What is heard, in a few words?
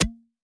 Water Splasher